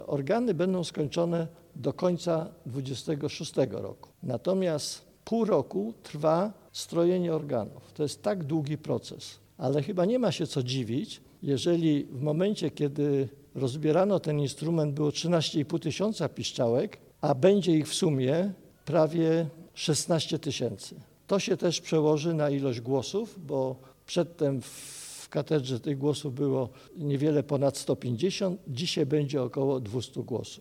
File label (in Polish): strojenie organ; remont organów Sauera; archikatedrze wrocł